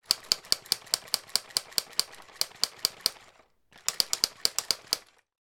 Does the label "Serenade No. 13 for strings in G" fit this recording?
No